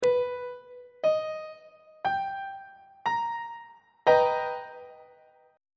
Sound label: a double sharp augmented major 7th chord on treble clef